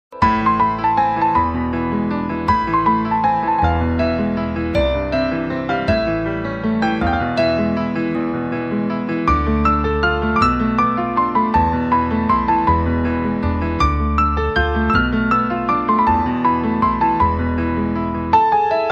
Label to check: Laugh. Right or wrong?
Wrong